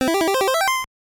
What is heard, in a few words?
Bonus Stage Perfect ~ Up Jingle; Mighty Final Fight; NES